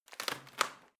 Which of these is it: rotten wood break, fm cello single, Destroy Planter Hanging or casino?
rotten wood break